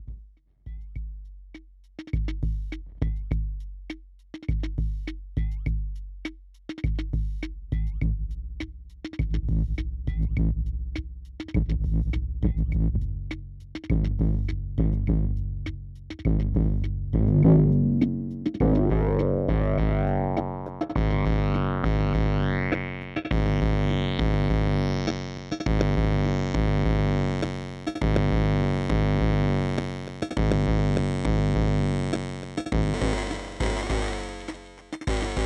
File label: EHX Mainframe Drum Machine